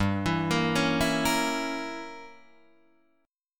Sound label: G Diminished 7th, Guitar, Open D